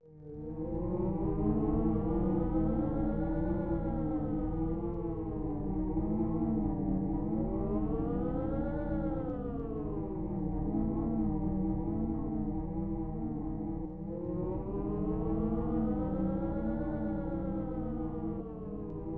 Wind

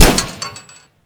TANK MG

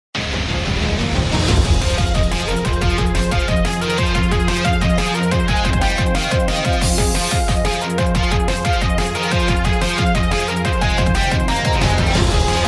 Victory, Postmatch; Unreal Tournament 4

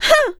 Fem Fireball Grunt; Afterbern Democralypse; Windows

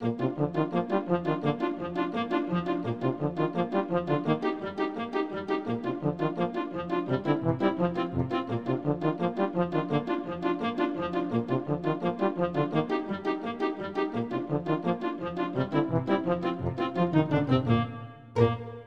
Upbeat Theme

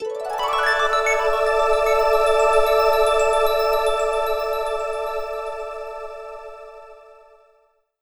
(Jingle) Home Menu - New Feature; Nintendo 3DS

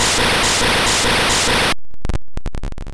Blizzard; sfx; attack moves; pokemon; gen 1; rby

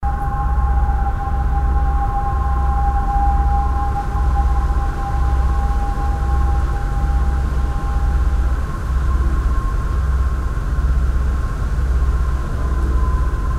Neighbor House Ambience; angry neighbor android gamerip 2017

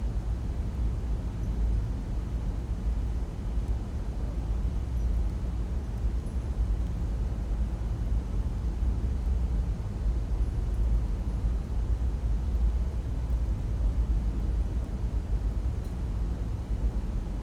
Ambient

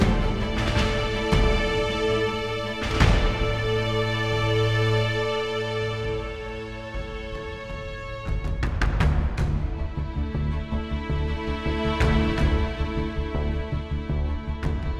Mood Mid

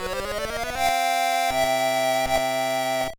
Big Victory; Mother 1+2; GBA

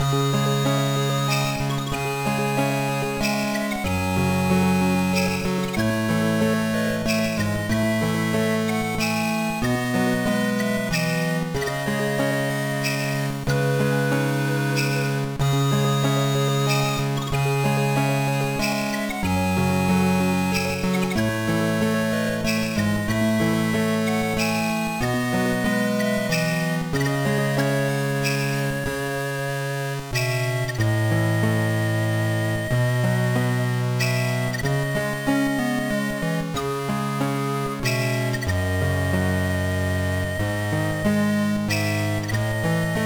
Snow Country; rpg tsukuru advance 2003 gba

